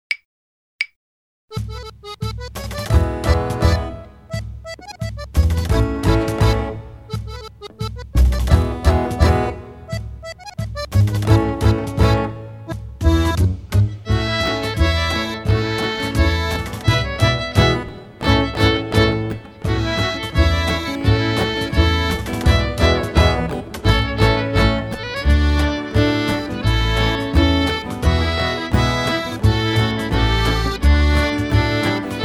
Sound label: Polka, violín